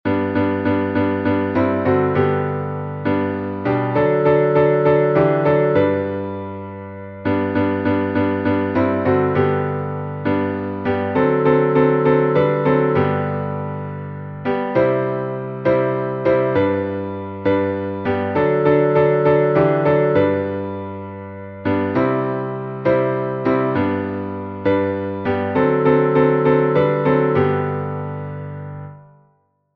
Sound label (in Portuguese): salmo instrumental; Salmo 103B; Melodia: Redeemed; Compositor: William James Kirkpatrick, 1882; Comissão Brasileira de Salmodia